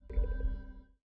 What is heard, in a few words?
zoom in; STEAM; tenfoot